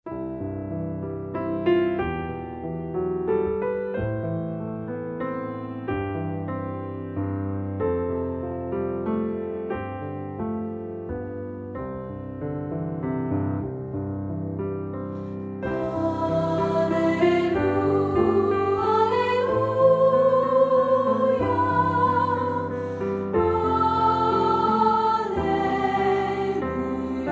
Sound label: alleluia praise SAB